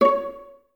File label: Violas C#5 Pizz; Miroslav Vitous; String Ensembles